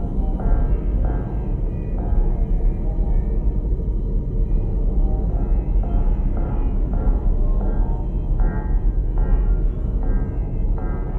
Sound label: ship colony ship idle; Stellaris; shroud